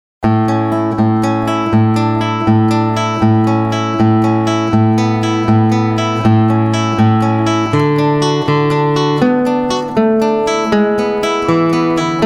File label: intermezzo, Gitarre; klassisch